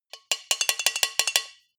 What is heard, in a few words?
bowl hits rattle fast damped